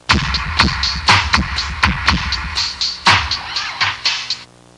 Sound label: echoing drum intro